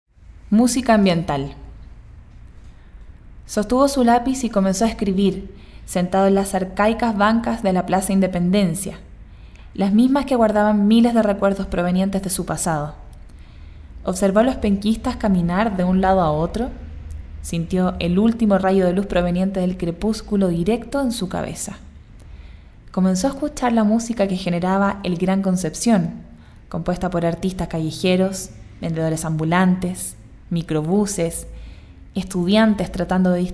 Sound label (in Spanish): musica ambiental